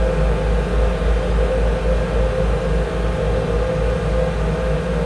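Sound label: shower ambience; hl2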